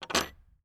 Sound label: Bolt Lock; 99Sounds; The Warehouse